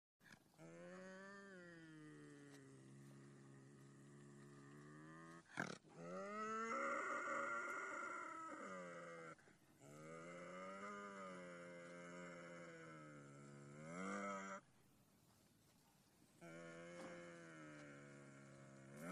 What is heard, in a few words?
BOBCAT LOW MOANS SNORTS; wildlife sounds